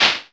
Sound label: Maracas